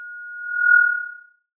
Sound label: Sound of an owl